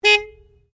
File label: HYUNDAI ACCENT 2008 HORN SHORT EXTERIOR; Hyundai hire car; Cars (Sound Effects:Vehicles)